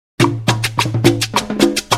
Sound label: salsa